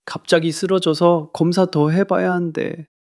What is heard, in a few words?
crying